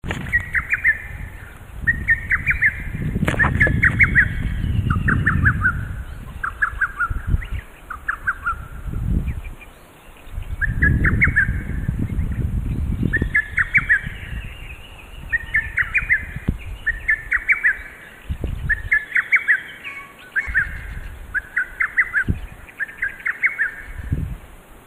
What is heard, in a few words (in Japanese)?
hototogisu